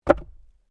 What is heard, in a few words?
Wood Log Place; Material components for constructing more complex sounds; Materials